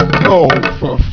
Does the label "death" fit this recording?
Yes